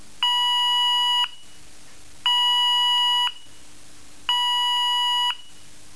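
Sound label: Intermittent 970 Hz at 0.5 Hz (1s On-1s Off)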